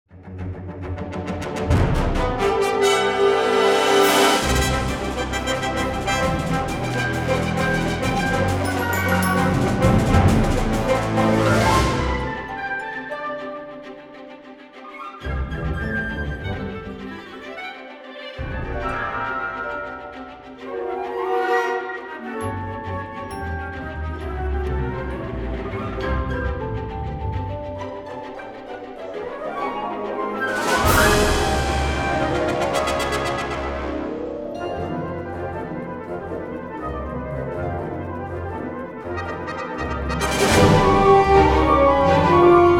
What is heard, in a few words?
Woodwinds Ensembles; Orchestral Series; Spring Birds; Marc Dall Anese